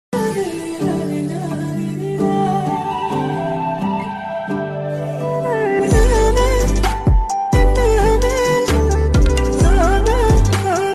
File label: lofi ringtone